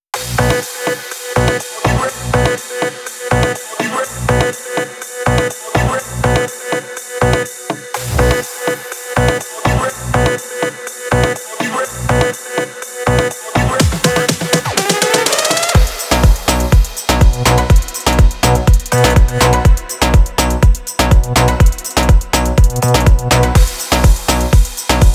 house electronic techno; By Burak Sound Bank

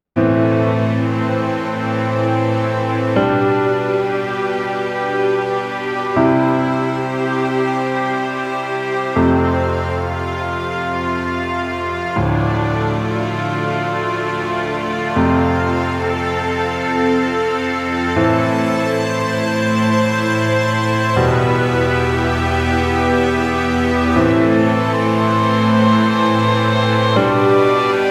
dramatic huzun; by burak sound bank; dramatic dram vol 16

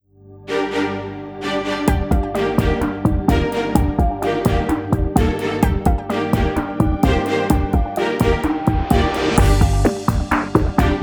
tension; by burak sound bank; tension gerilim vol 46

